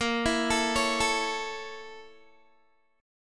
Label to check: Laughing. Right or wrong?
Wrong